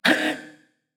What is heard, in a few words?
Grunt Jump; Inara; Paladins